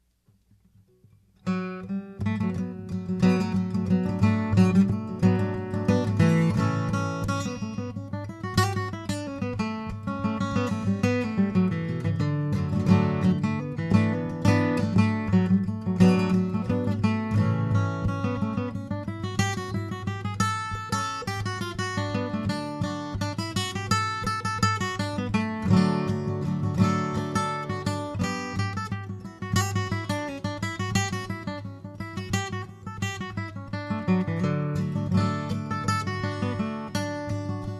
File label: ragtime